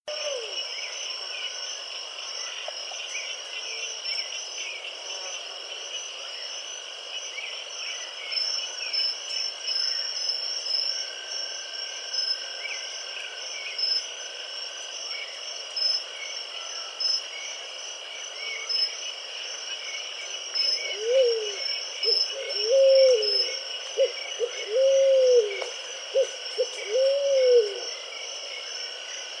creamy-bellied thrush and woodpecker; soundscapes

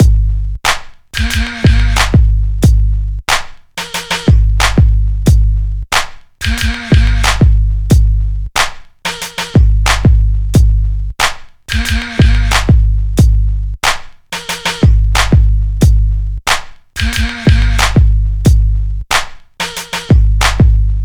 91 bpm drum loop g sharp key; drum loops

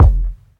wet kickdrum sound f key

